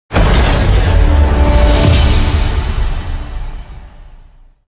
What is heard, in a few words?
victory royale; cstrike; pubg